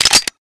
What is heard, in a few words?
weap get; sven; cof